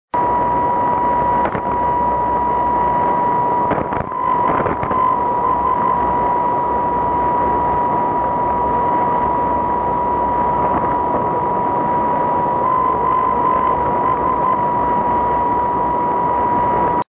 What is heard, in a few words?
OS 515kHz